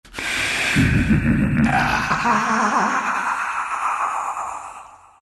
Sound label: laugh, zh; Appa